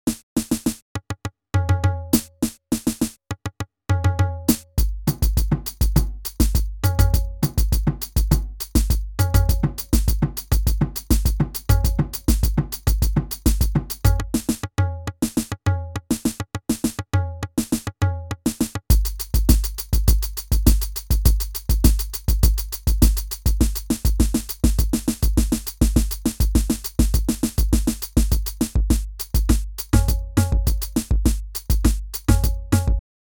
Yamaha FGDP-50

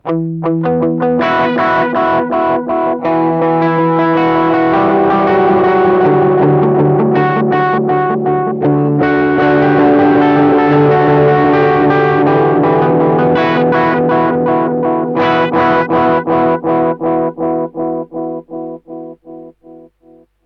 Delay Overdrive

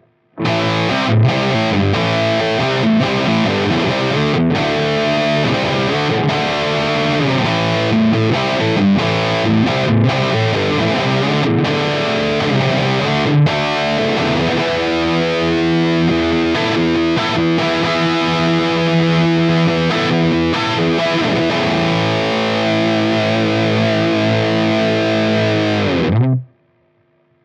Highgain; Guitar Rig 7; Superfast 100

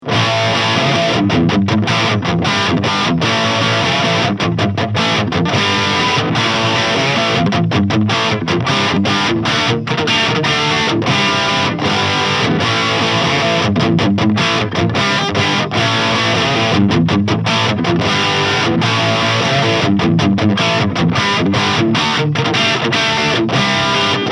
Engl E670FE Lead

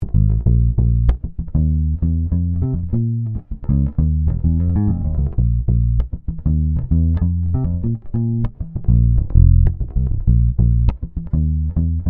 Ibanez EHB1505 Neck traditional passive

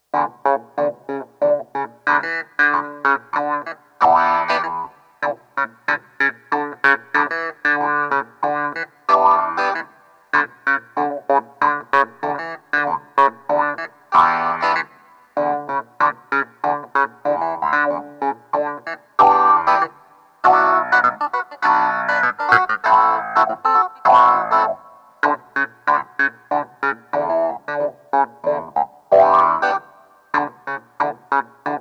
Hohner Clavinet D6 Cry-Baby Wah-Wah

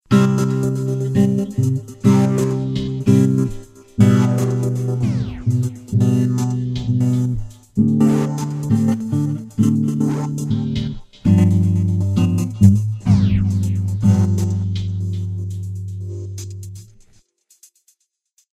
Motions Growling Hats

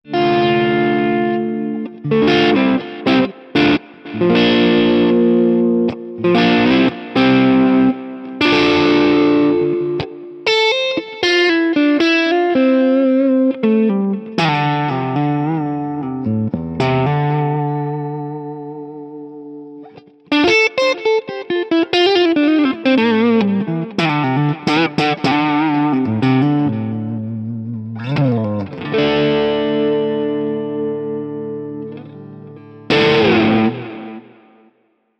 Clean Lead; Archetype Tom Morello

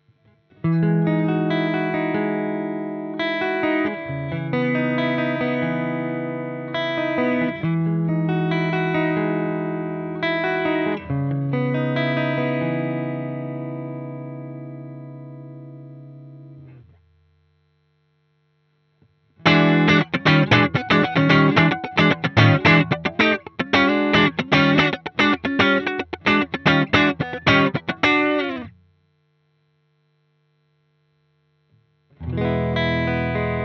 Fender Deluxe

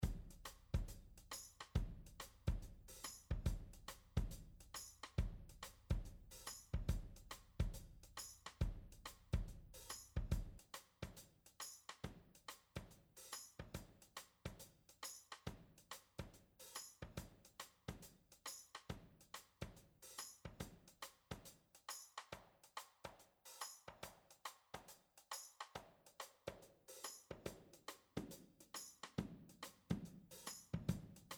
Elysia Low Pass

